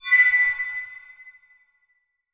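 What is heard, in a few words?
Magic Shiny High Tone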